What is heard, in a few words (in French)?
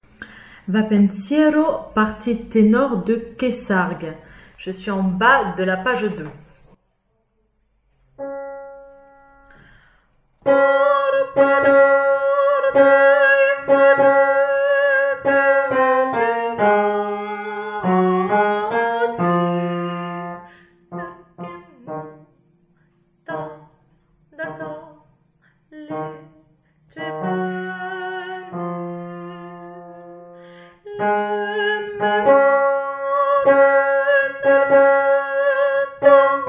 Tenor triolet; Va Pensiero; Verdi